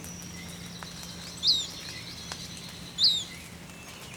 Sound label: sublegatus modestus